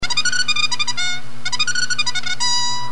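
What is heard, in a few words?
horse race, bugle; army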